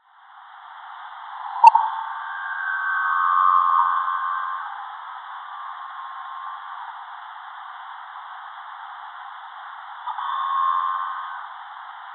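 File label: minke whale; Salish Sea; onc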